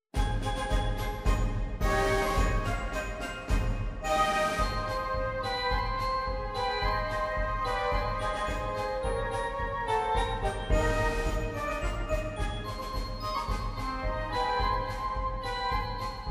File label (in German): Marsch; Saratow Marsch